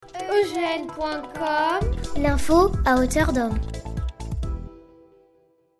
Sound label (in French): Jingle; Def hauteur d'Homme